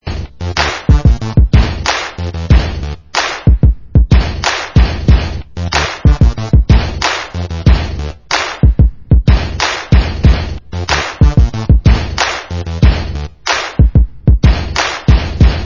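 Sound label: Instrumental, ringtones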